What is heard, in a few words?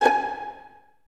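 Violins G#5 pizzicato, String Ensembles; 23 Violins; Miroslav Vitous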